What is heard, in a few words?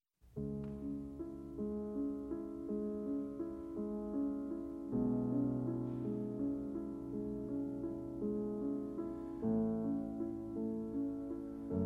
The movement begins with this dreamy fantasy world, almost hypnotic with a strong base and triplets ostinato (repetition of the same motif).; Beethoven Piano Sonata No. 14 Moonlight Sonata